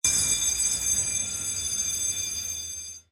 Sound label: Ringing Bell Sound